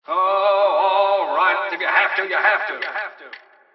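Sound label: ambience; pork knox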